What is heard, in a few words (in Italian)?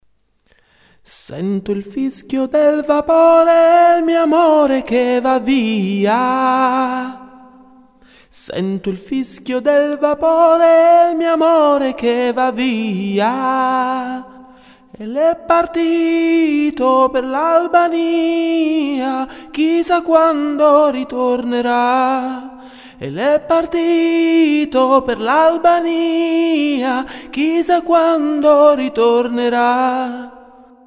contralti